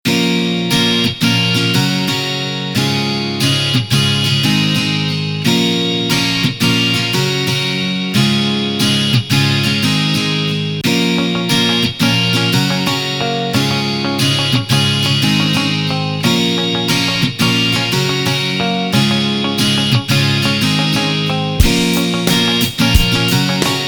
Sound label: Acoustic Rock